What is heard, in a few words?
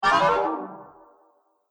Arcade Game Falling Mystery; Video Game Sound Effects; Retro Inspired Sound Effects - Think Nintendo, Atari, C64, Etc.; Andrew Fleming